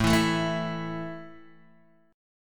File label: A 5th A down Guitar Open D